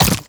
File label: bite, headcrab; reviver; creatures